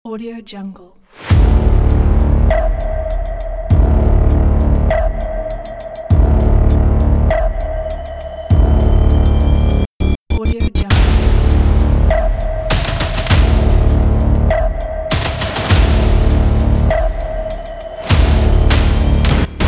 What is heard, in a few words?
game tension intense trailer